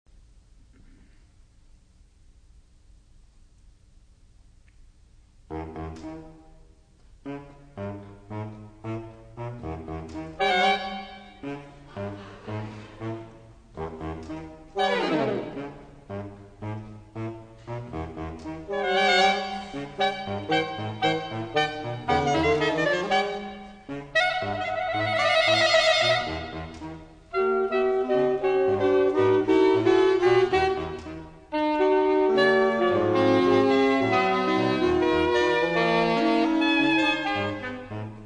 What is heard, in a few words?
Mood Music